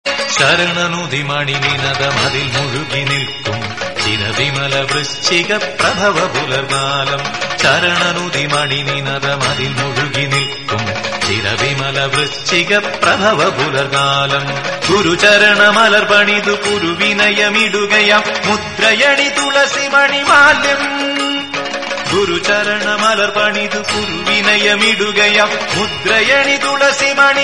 devotional songs malayalam; ayyappa

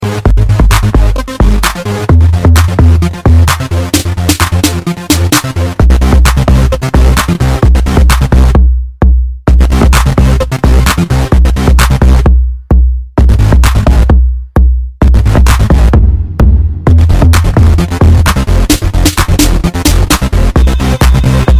boom boom; Jazz

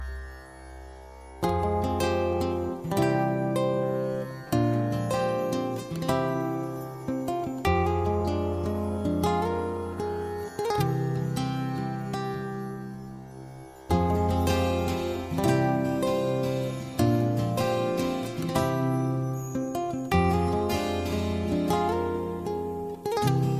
guitar; Puthiya Mugham; Indian

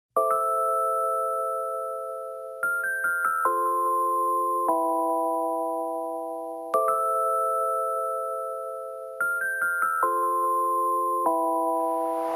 iphone 5s alarm

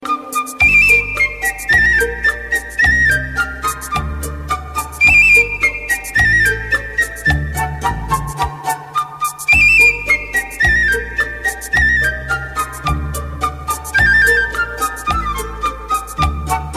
indian classical instrumental ringtones

